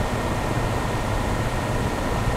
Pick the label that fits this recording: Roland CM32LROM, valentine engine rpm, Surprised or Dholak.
valentine engine rpm